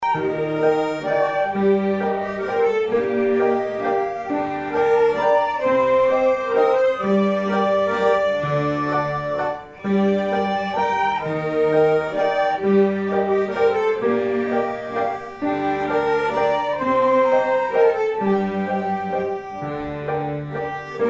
valse bosnienne

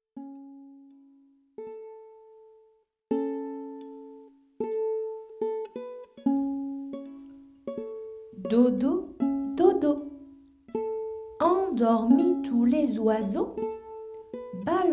berceuse chinoise

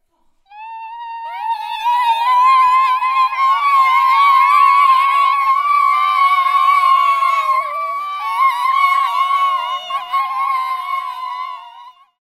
youhous

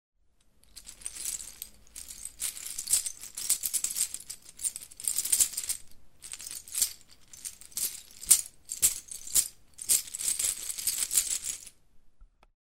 sac son rideau

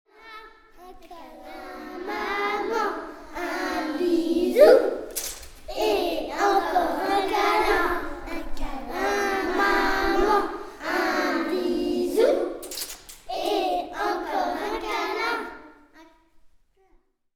comptine

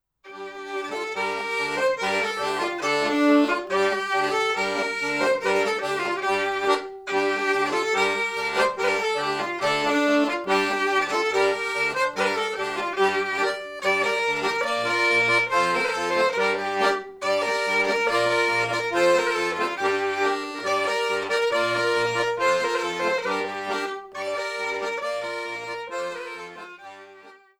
bourree

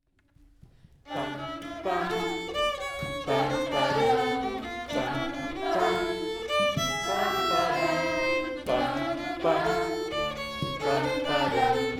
Bourrée 2 temps

